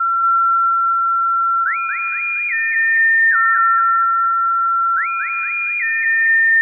synth, Hardcore Breakbeat, 145, Ebmin; Big Fish Audio; Hardcore Breakbeat Action; Riddim

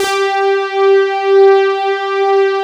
ANALO, Wavestation; Greytsounds VOL-1

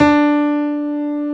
PIANO, Roland

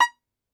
Tenor Banjo, Plucked Strings

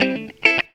GTR, GTR RIFFS, Funky Elements; Zero-G

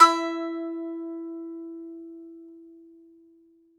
BAGLAMA E04L; E-MU Producer Series Vol. 7 – Old World Instruments

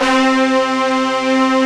RICH BRASS C3

